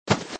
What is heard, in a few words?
Cookie Collected; Angry Duck Cannon 1.5